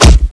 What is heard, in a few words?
hitwall